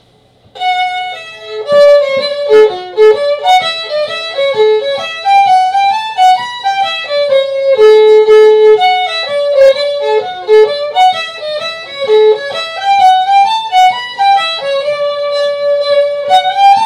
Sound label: lincoln hornpipe bacca pipes